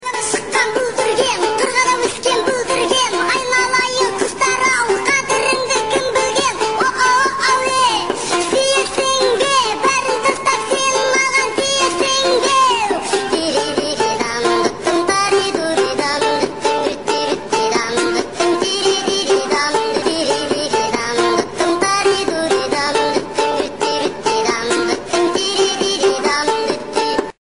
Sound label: Tajik Polka